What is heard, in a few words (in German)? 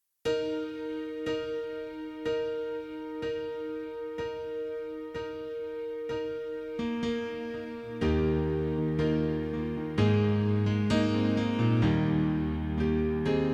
Pianoplayback Eb-Dur